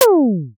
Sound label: cute plop; comedy